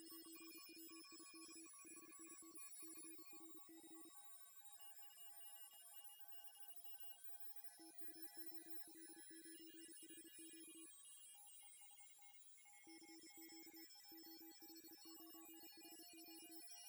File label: 7020KHz; Winrad